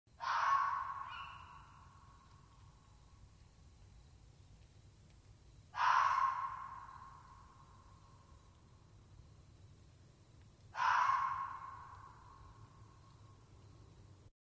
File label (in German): Fuchs Bellen